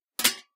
plates hit

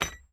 Bolt Lock; 99Sounds; The Warehouse

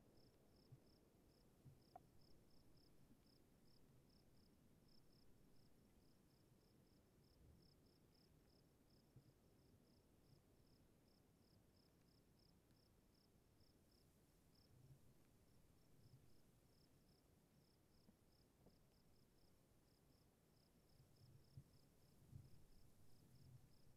Crickets quiet lime hill; Oregon; Wilderness

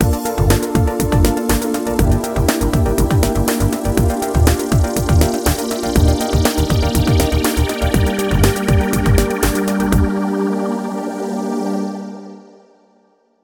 Signature Sound; FXHome; Signature Tracks

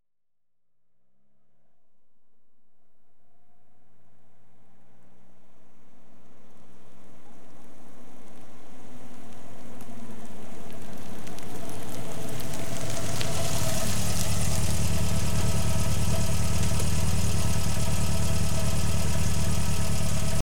Ford Fiesta, 1-1 L Hatchback, Ext; Auto; BBC Sound Effects Library

